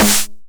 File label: 909 SD Tape Sat